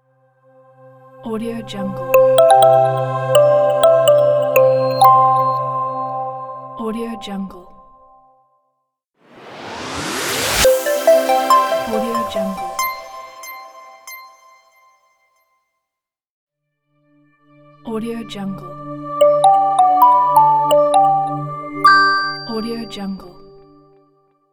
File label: simple logo pack; blackcrocodile